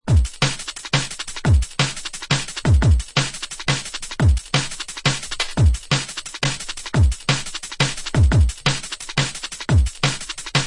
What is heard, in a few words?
Dreadz Bouncy Steppaz, beats; ray keith dread jungle breaks vol 1 60 beats for dubplate clashes battle junkies